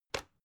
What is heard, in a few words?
electric whisk button down; haus-garten; kueche